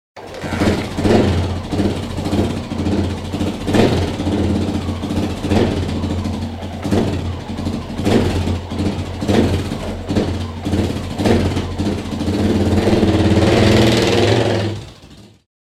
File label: racing